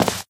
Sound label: white tile, footsteps